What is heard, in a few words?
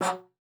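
F#3, bass flute; Xsample VOL-2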